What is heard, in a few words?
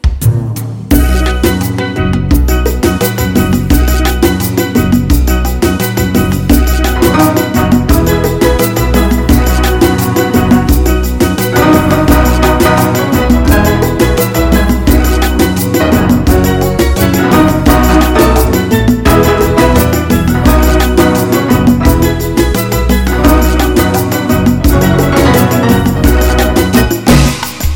Great Caribbean Music